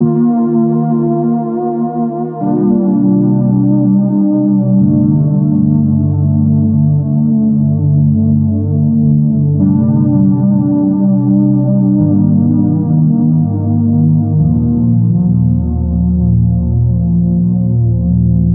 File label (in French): nappe